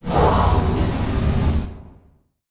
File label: Transition Whoosh Fire